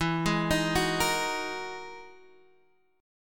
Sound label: E-Major Sharp 11th E, Guitar Open G